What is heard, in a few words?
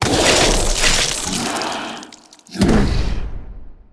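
Boss death, monster; agelus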